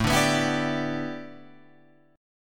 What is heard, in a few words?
A 7th Suspended 2nd Suspended 4th A Guitar Open F, chord; strummed